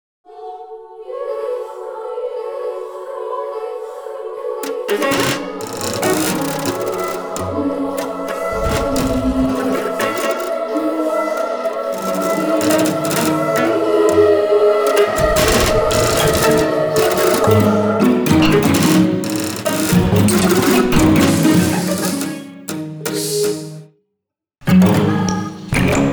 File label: Snare; Kudatah—Vol. 2